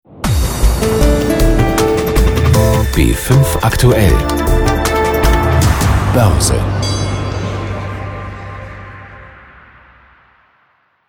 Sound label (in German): Opener Boerse